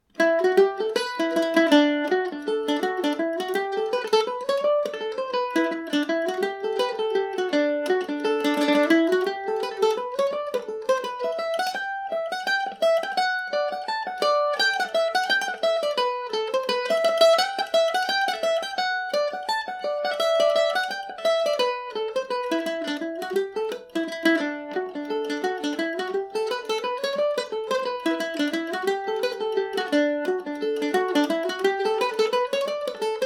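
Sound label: reel E minor, Irish mandolin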